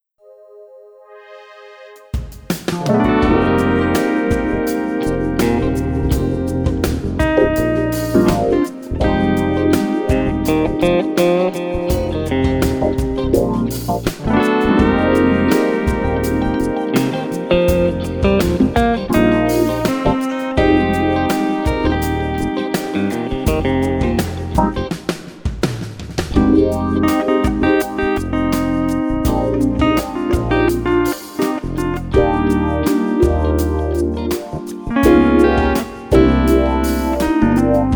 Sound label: Steel and Strat